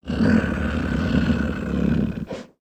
Pets Lion Sleep; creatures; Lego

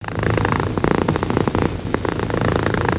Pet Cat Sleep Purr; creatures pets

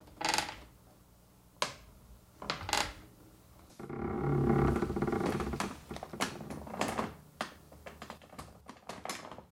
Jungle Bridge Large; gnarled forest

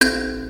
Model Build

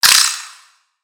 Spider Die; creatures mechanical; Lego

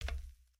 Foot Steel Boot Walk, footsteps

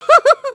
fear

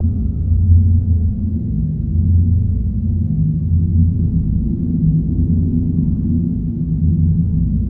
Cave Drone Ambience

